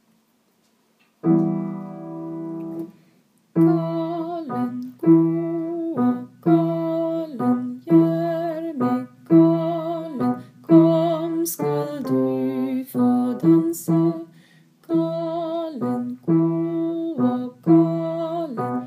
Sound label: Mazurka BASS